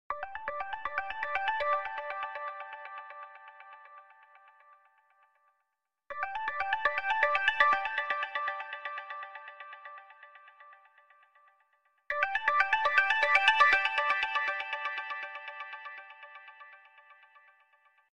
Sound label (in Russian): melodiya sms zvuk